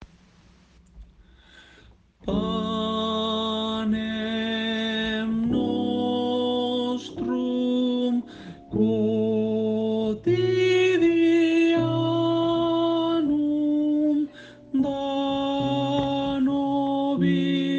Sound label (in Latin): Pater Noster Tenores